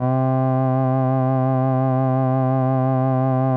C2, SYNTH WAVES; Music Store Sound Service; Einstein

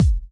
BD; Best Service; Dance Mega Drums

